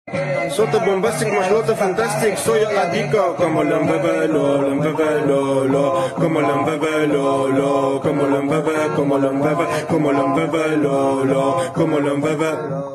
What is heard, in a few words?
Phonk